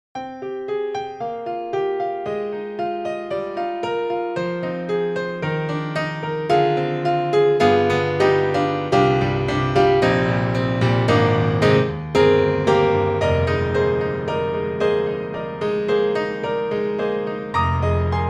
Grand Pianos Studio 7ft Bright Studio C7; Synthogy; IvoryII

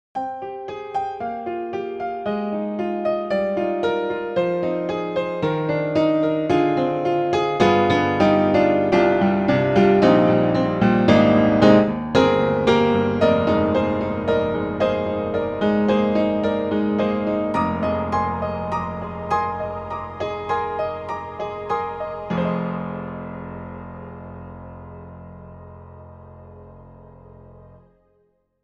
Upright Pianos Modern Upright Modern Pad; Synthogy; Ivory II

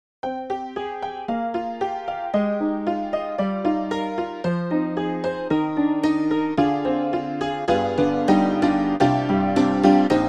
Honky Tonk; EastWest; Quantum Leap Goliath

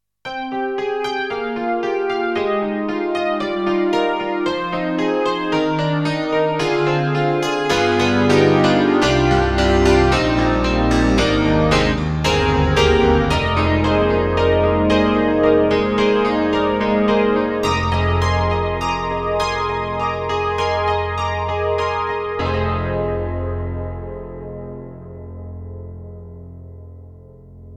KORG N1R MIDI Grand

